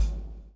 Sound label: BLOW; Spectrasonics - Backbeat